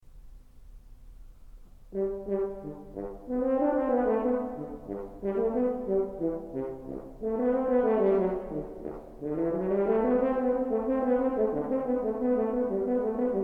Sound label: Cello Suite Courante